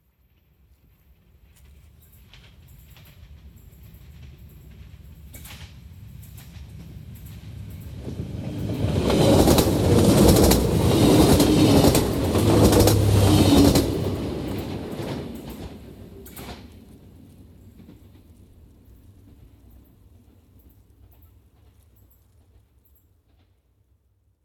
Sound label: Train Passing By Fast, A quite long lead-in as the train approaches; then a very nicely defined sound of the track as the train pas; Train Sound Effects; Lynne Music